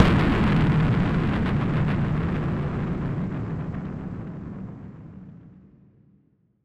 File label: Noise Bomb; musicradar; bomb factory